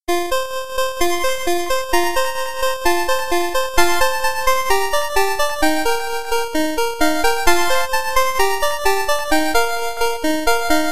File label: Chiptune